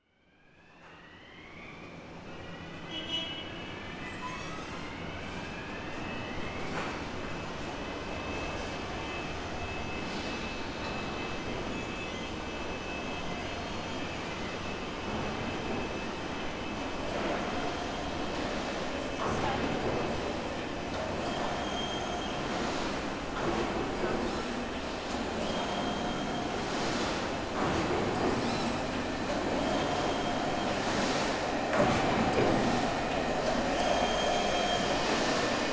tyre factory floor machine siren forklift horn pneumatic decompression